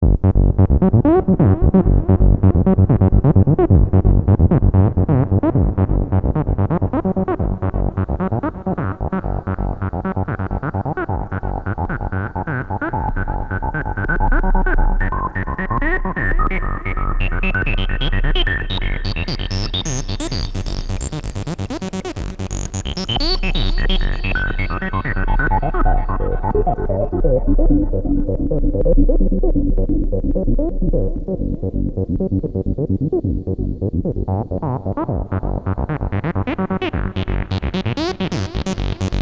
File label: ensoniq mirage dsk-8 sounds, stretch external fx